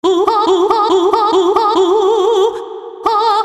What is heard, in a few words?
hoohaa